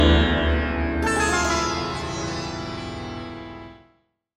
Death Song; prince of persia

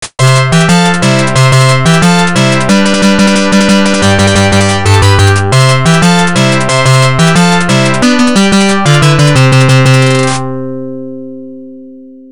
Stage Clear; gun.smoke arcade

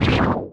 Attack Fly

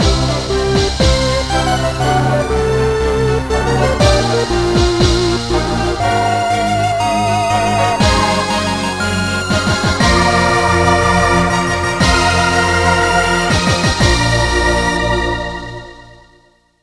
Falling Action; Mighty Flip Champs

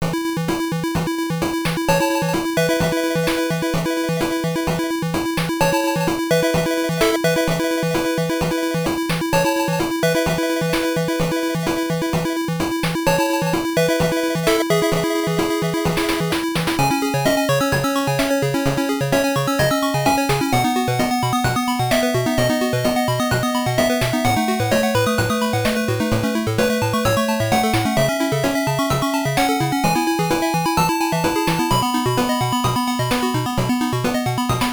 BGM

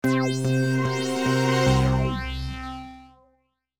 Death Jingle; Scooby-Doo! Who's Watching Who; DS

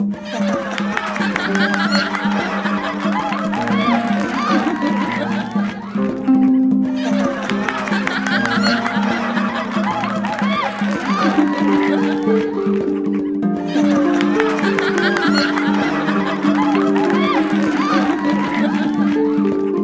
Puppet Dancing Music Harp

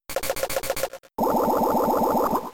Bubble; Pokemon; Gen 3; attack moves; RSE

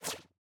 Dash; Boomerang Fu; Dash Tail

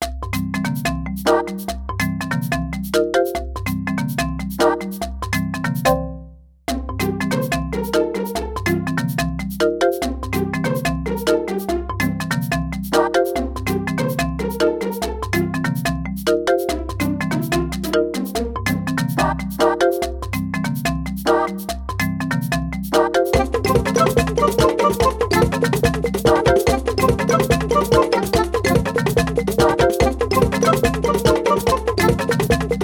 Jungle (Hurry Up!); super mario maker 2; NSMBU

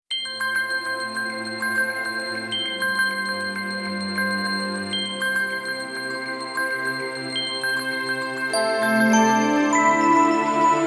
Strings Version

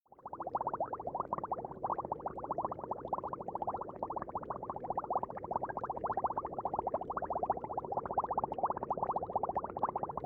sound Marsh Swimming Normal

